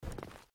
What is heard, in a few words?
Footsteps